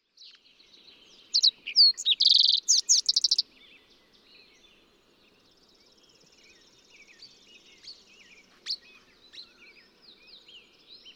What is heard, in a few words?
Fox Sparrow